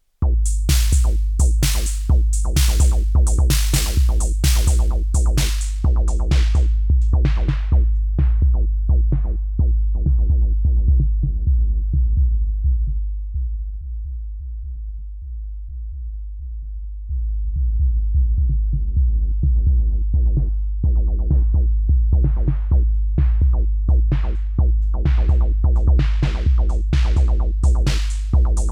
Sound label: Bam Filter Bullfrog